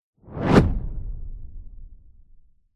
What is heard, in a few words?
sound design whoosh flames fire cinematic; zapsplat